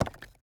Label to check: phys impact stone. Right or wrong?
Right